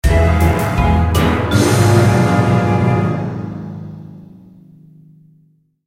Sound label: Scary Ghost; Rise of the Pirate God